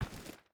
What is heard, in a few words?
footstep rubber boots stones walk; Rust